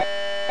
Scanner srn

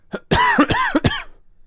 Triple Cough

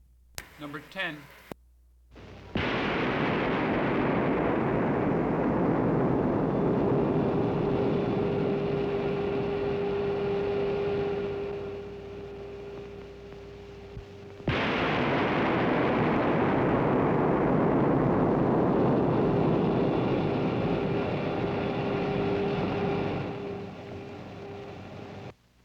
Massive Electrical Discharges

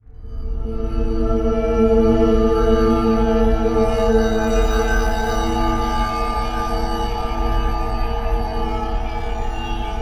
Portal gate summon

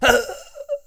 Fight Shout Man Hit

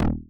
Pc Sloppy Bass

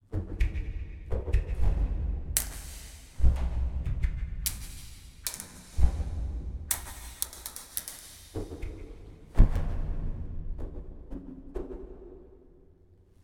Puppet Kung Fu

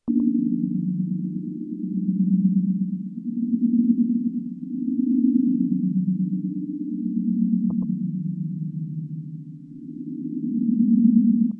Add synth Stereo Delay

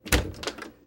Wooden Door Open

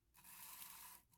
Sliding Cup On Table

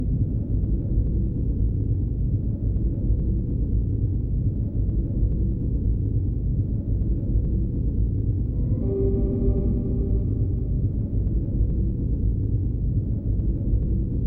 Tomb Ambience

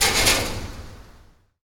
Fly Screen Scrape

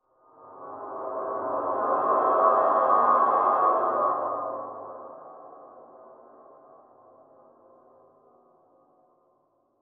Fear

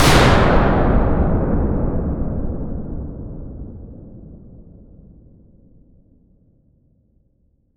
Large Explosion

